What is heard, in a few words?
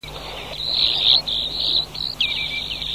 limicola falcinellus